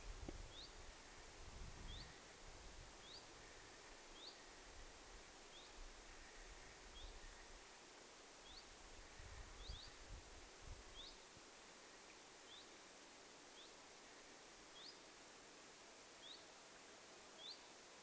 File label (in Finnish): kaksi tiltalttilintua hyit-tiltaltteja